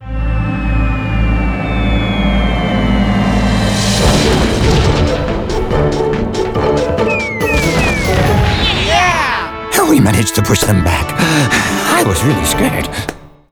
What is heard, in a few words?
Outro Victory US; arthur and the revenge of maltazard; wii